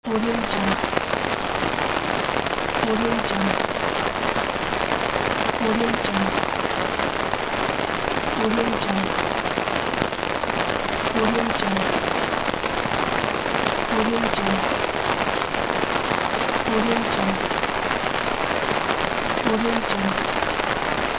under an umbrella in the rain

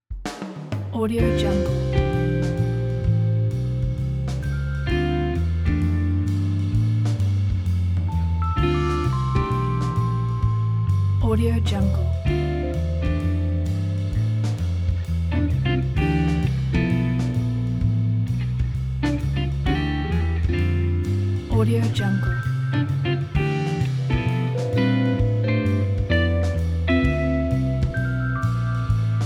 smooth jazz

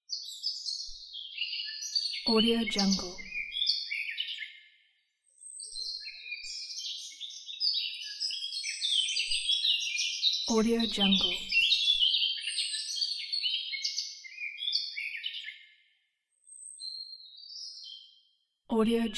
birds

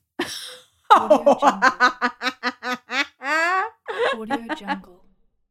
woman crazy laugh

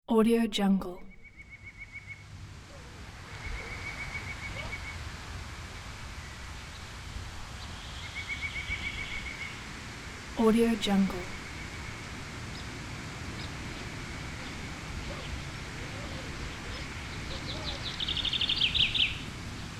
forest by morning

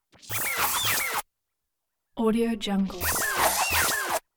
squiggle beam pass bys some back and forth; prosoundfx

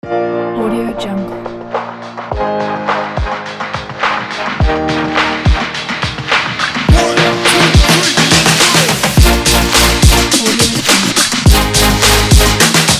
sport beat motivational

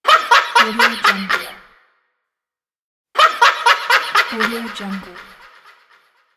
scary laugh

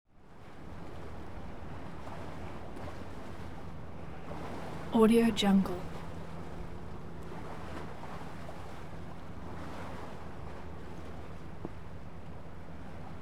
harbor ambience with deep engine rev; hollywoodedge

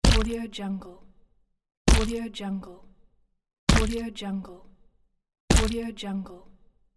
trolls steps on wet dirt

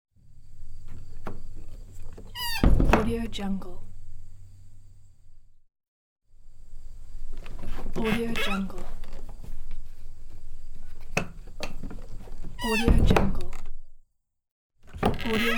opening closing barn door

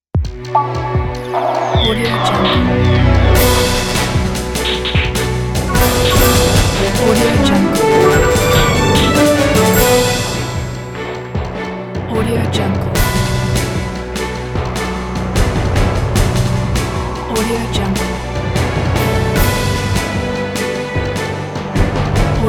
news special edition orchestra

